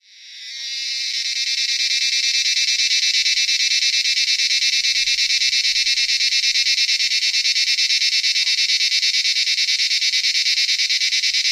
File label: cicada